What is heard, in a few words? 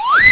slide; xfce